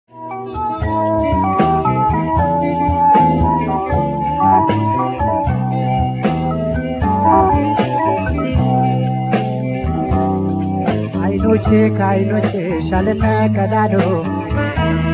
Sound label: tango